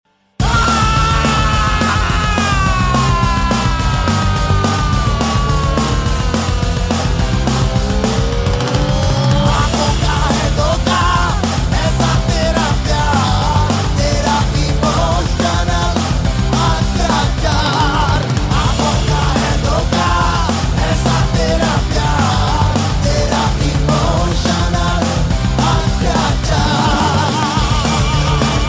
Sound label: crazy rock ringtone